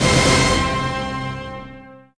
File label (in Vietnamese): am thanh thong bao quan trong ve chuong trinh